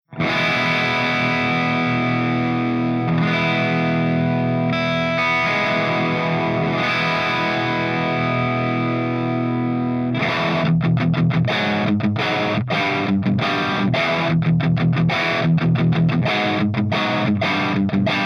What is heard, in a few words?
JCM CM